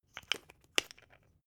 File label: glass pepper close; berufe; gastronomie; soundpool